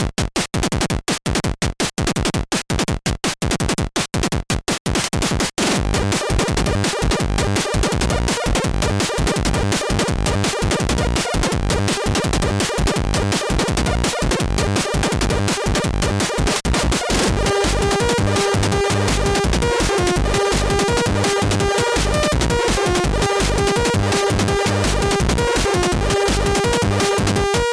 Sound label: sawmen break